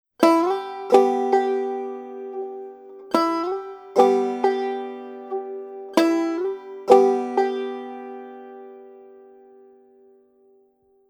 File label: Slides